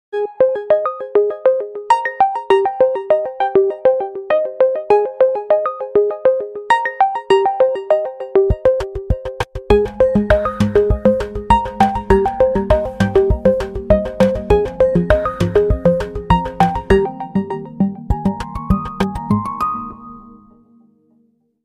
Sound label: stars oppo coloros 5 ringtone